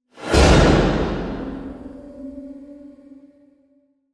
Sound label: Hit To Whoosh